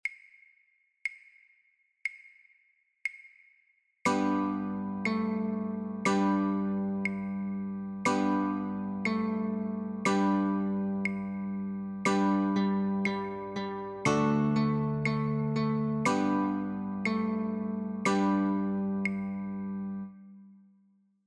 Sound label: Hot Cross Buns Notes Claves and Chords, guitar; childrens